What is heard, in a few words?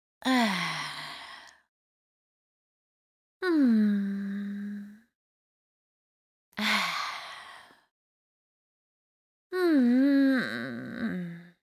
Swim Loop; Hollow Knight: Silksong; Sherma